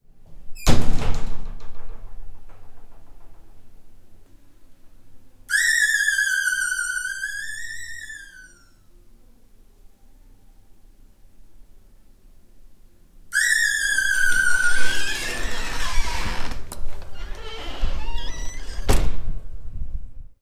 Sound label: intercom, concert hall; gagarin concert hall; sergiyev posad